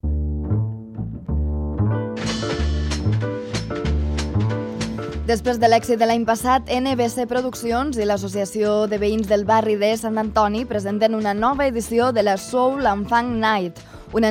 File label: soul funk night